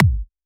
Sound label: Bassdrum; Vengeance Samples; VEE Bassdrums